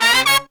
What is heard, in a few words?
120BPM, Horns